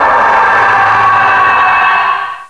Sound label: skull, npc